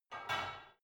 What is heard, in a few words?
barbell only replace reverberant soft; sport freizeit; fitnessstudio